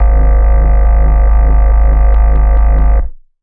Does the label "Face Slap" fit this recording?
No